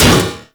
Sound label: Drone Fall Impact; Grineer